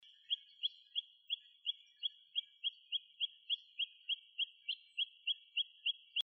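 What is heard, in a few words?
long-billed plover call; ikarutidori nakigoe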